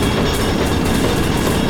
Machine Generator Loop

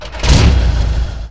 Huge Truck Start Engine

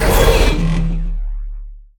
Energy Station Deactivate; Energy & Forcefields

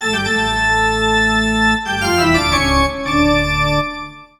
Music Tocatta Horror; SFX Kit; Foley - Misc

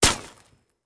Bullet Ricochet; Sound Ideas; Guns

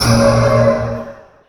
Pickup Magic; SFX Kit; Pickups

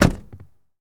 Jeep Wrangler Door Close; Trucks

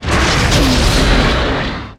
Rocket Launcher Shot; SFX Kit; Weapons

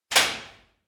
prison; Inquisitor 2

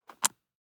DICE on Cardboard, Grab, Standard, 2 Two Dice; Samples; ArticulatedSounds; DICE Sfx Party (Extended)

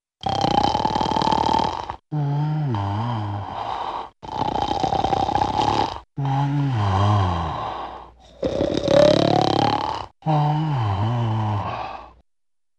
Funny Man Snoring; Hollywood Edge; Cartoon Trax

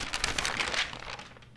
Rustle Newspaper Blow; SFX Kit; Foley - Rustle

